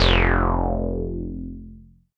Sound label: BAS F1, SCI Prophet-5 synth bass